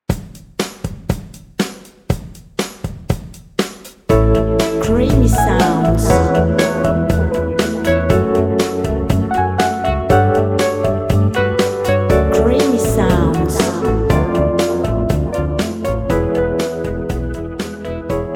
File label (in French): creation musicale groovy nostalgie